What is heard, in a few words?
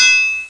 Klank; PUZMANIA